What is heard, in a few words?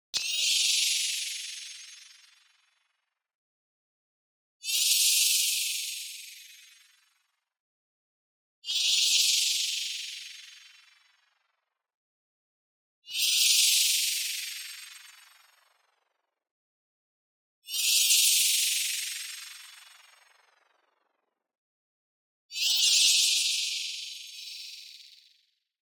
shimmer, light magic sweep up; boom library; Anime Essentials; DSGNMisc